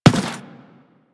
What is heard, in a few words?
Run Default, footsteps; Warframe; Teshin